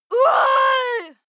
female attack scream long

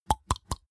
snap cheek sequence; soundpool

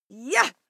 female fight attack shout short; schreie; menschen

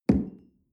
coffee place tablecloth soft

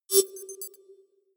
computer sound, signale; soundpool

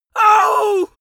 pain compassion male; vocals; comedy-cartoon; soundpool

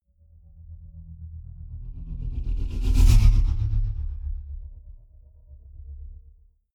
sci-fi vehicle drive by tonal flutter; raumschiff

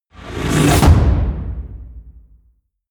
wham, whooshes

